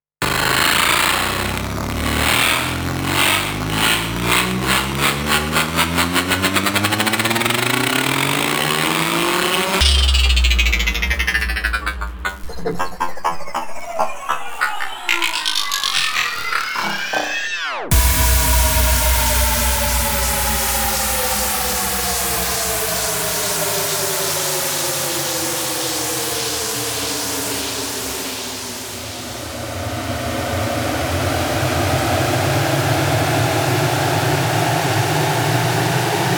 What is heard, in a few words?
uplifters downshift